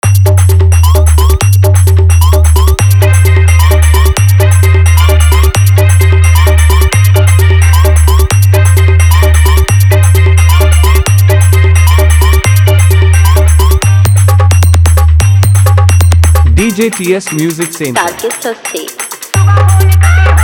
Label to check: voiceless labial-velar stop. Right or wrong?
Wrong